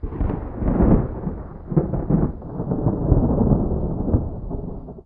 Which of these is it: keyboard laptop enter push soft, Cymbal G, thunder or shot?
thunder